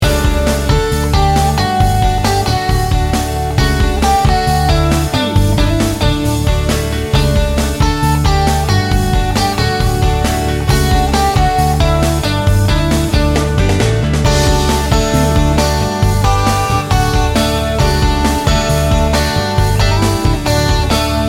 americana

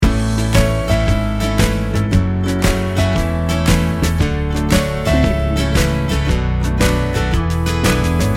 summer and sunshine loop

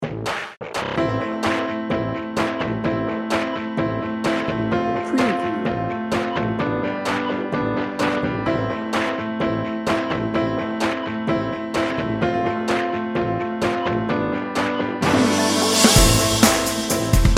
adventure junkie bed

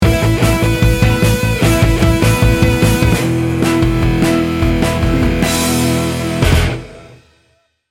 instant sunshine sting bumper

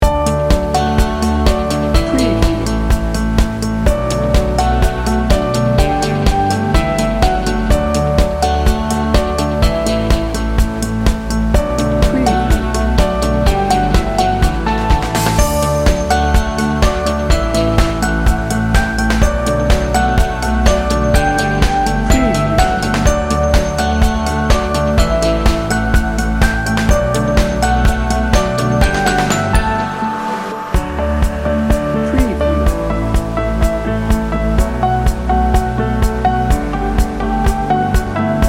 upbeat inspiring corporate pop

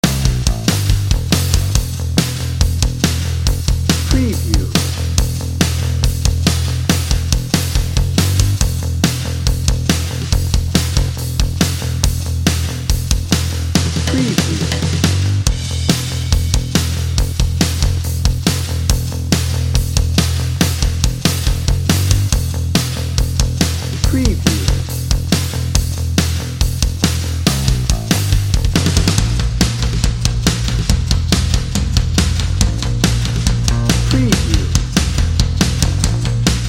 macho rock dnb

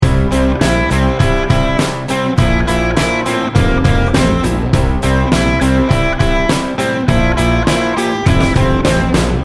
laidback indie rock loop

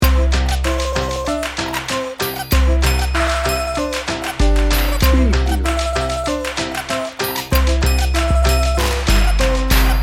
percussion party loop

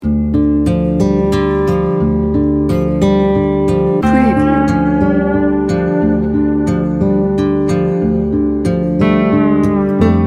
dark places

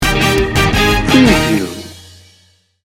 ska party sting bumper

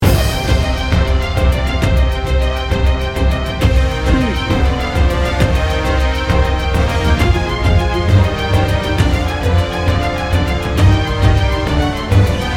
magnificent adventure loop

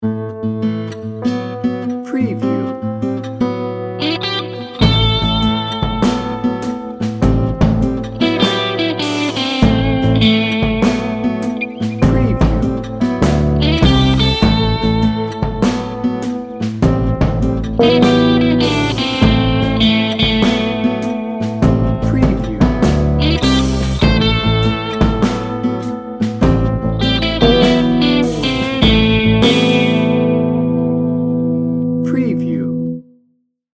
smoky blues

